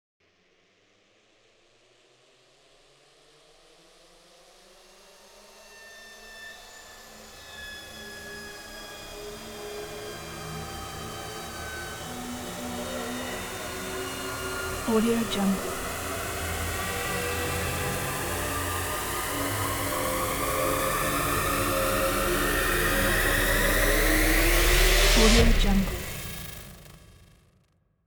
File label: horror tension thriller